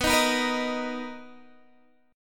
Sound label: B Major 7th B down Guitar DADGAD